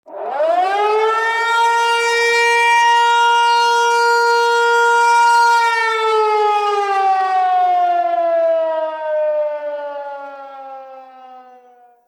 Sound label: air raid siren